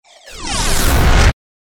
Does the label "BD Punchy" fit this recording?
No